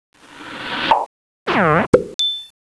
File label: Laser Lab Sound